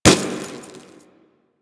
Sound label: Glass Bullet Impact; Warframe; Ambience; Nineteen Ninety Nine